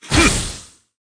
rush; cike

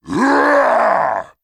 shout; shout juren nuhou

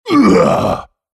howl man

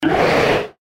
howl huge monster

